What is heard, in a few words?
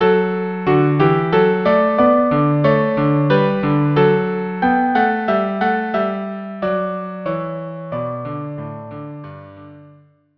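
violin cello; Dance of Blessed Spirits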